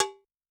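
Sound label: Cowbell; Yamaha DTXpress-II; Perc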